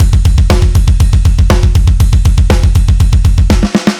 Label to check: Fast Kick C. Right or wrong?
Right